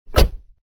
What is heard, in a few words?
car door